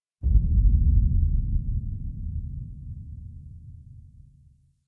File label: SFX Final Hit; Warframe; The War Within; Mountain Pass Return Peak; Cinematics